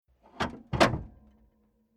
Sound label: Old Landrover Bonnet Catch Release Metal Ring Off; Transport Agriculture; Truck Sound Effects